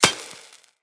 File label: Laser Impact Metal; Weapons; Bullet Impacts; Surface